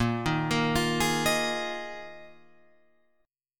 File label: Bb 7th Suspended 4th Bb down Guitar Open F; arpeggiated; JGuitar